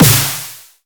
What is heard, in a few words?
Snare, Dubstep One-Shots Drums; Ghosthack; AC2024 - Day 13 - Dubstep Sounds 2025; Grayness